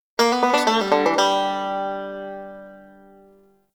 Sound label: Spear Fishing Banjo; Weapons; Warframe; Eidolon Fishing Spears